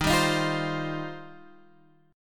D sharp 6th Add 9th D sharp down Guitar Half Step Down